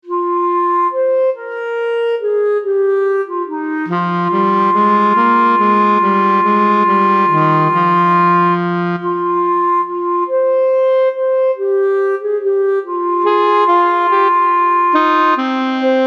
Duet, Clarinet Saxophone; Scarborough Fair

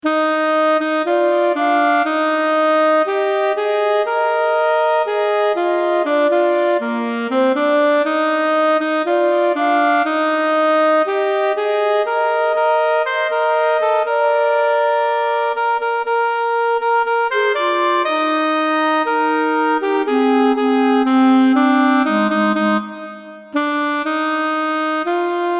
Duet, Clarinet Saxophone; All Around My Hat

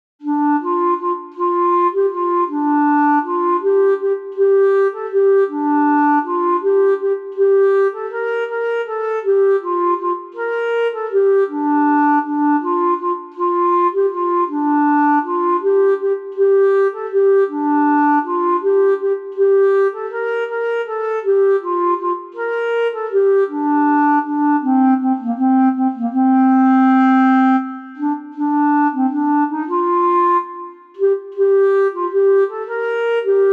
Bb Clarinet; Whiskey In The Jar

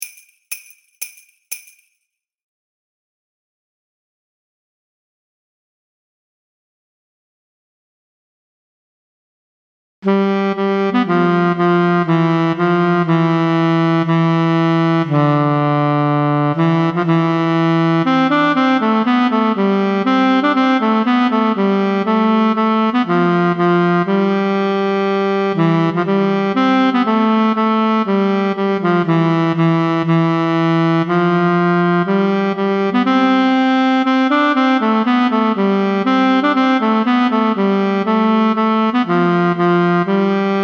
Country Gardens Alto Sax; Duets Clarinet Saxophone

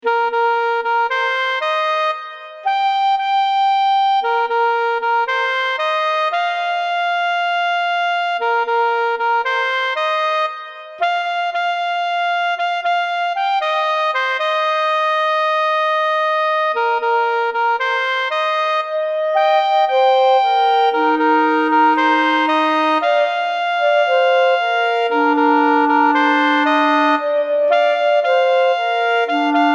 Duet, Clarinet Saxophone; Tom Dooley

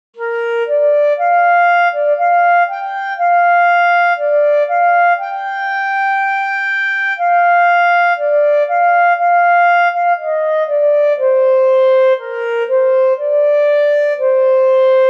Michael Rowed the Boat Ashore Bb Clarinet; Duets Clarinet Saxophone

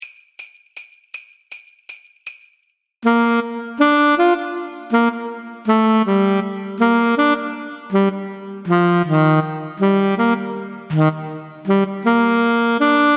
Whiskey In The Jar Alto Sax; Duets Clarinet Saxophone

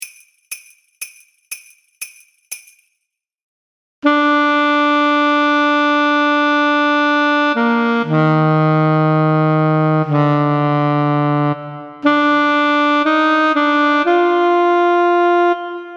Michael Rowed the Boat Ashore Alto Sax; Duets Clarinet Saxophone

